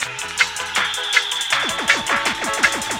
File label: Roll Dancehall, 12A; Jungle; Blu Mar Ten - Jungle Jungle - 1989 to 1999 Samplepack